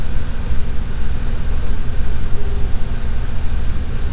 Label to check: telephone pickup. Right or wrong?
Wrong